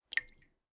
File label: water drip into ceramic bowl pings; prosoundfx